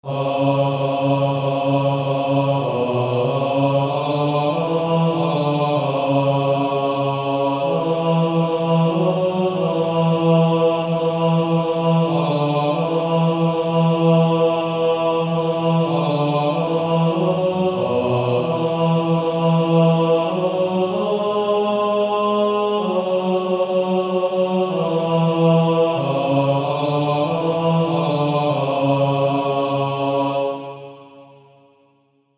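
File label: Male Choir, bass 1; Baritone; Sun of My Soul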